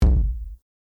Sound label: kick comp